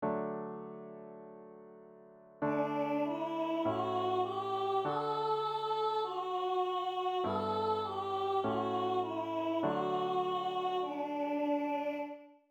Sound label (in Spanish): Entonacion mujer y nino